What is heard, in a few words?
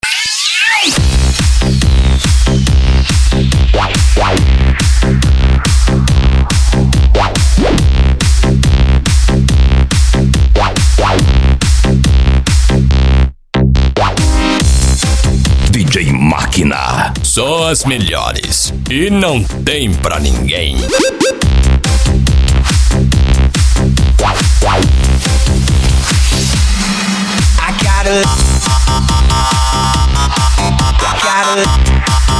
mega electro mix